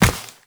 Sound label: Step Ice, Footsteps, Walk; Warframe; Tenno